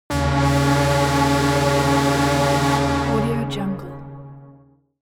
cinematic elements synthesized saw drone low

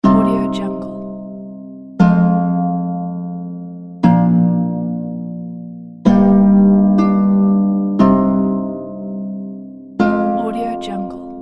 magnificent harps

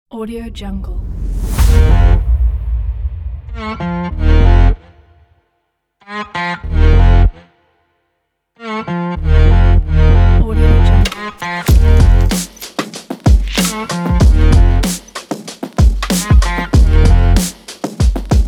hip hop rock; kicktracks

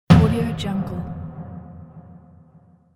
delayed drum tom hit

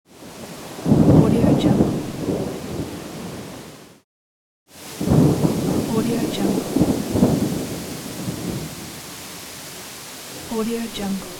thunder

